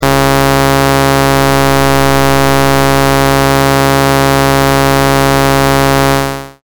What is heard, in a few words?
buzzy